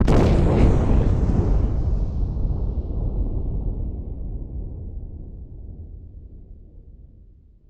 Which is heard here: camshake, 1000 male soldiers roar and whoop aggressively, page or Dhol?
camshake